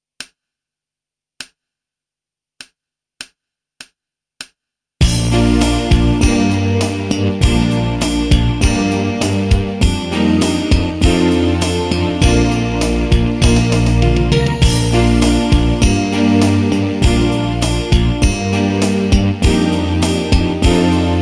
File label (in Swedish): trombon trumpet